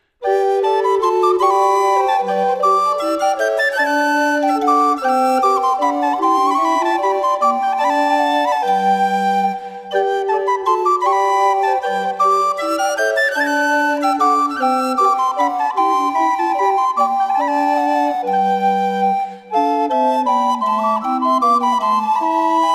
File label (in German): Galliarde a 4; Venturio